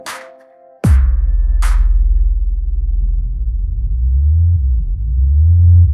funny

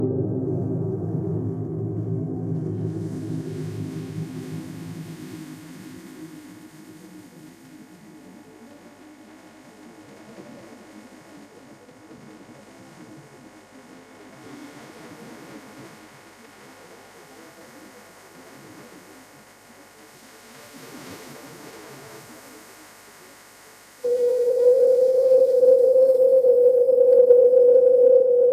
ambient nature

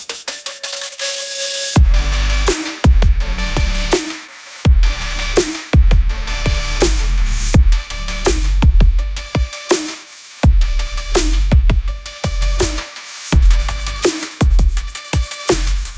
ringtone edm

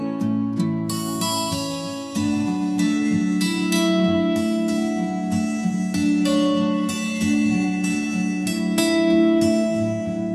ringtone romantic

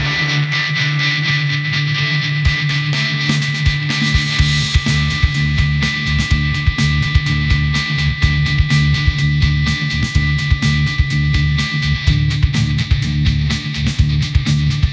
ringtone rock

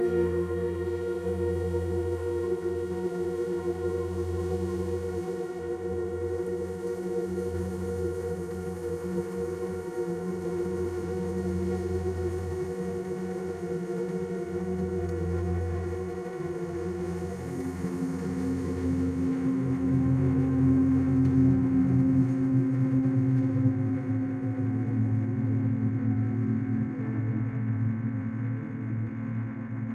ambient meditation

